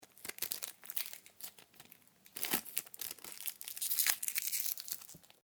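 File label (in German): Klebestreifen zerknüllen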